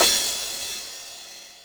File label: CYMBAL, Yamaha RM 50; Drum Samples; Cymbals